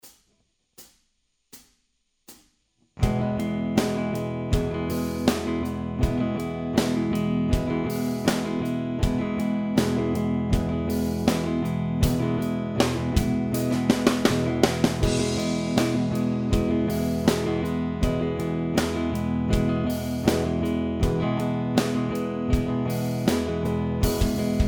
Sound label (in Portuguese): Sub Div Ritmica 3 tatataaaa batida por tempo